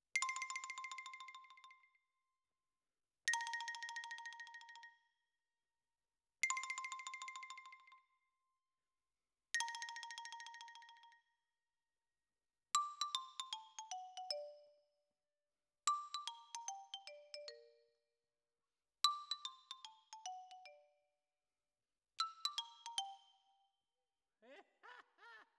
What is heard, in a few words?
Xylo Close